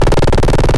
vehicle

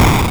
exp shortest hard, Explosions

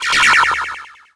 movement portal

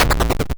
exp cluster, Explosions

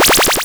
sounds powerup

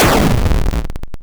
explosion medium

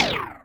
death scream android

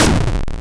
exp short hard, Explosions

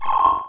interaction

